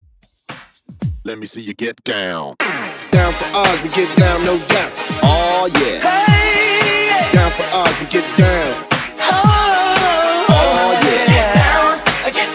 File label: 90s Boy Band Uptempo 114 BPM; Break Hype In